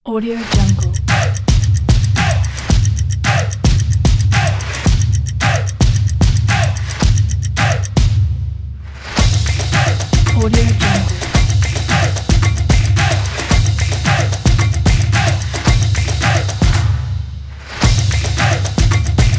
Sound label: in sport